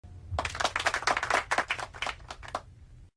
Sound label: clap small